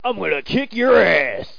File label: DEMON